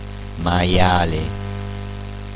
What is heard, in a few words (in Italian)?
maiale